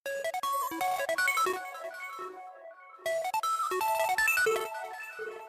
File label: Ok Jaanu Message Tone